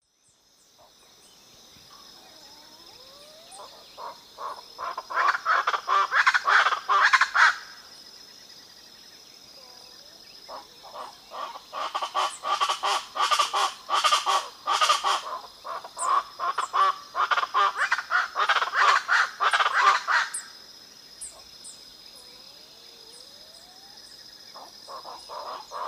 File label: Ortalis guttata, group calls; S. America; Eastern Lowlands